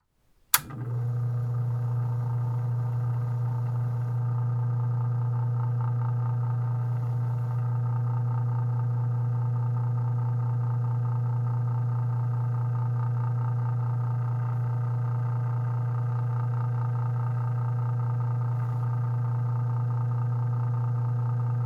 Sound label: INT Webster On Off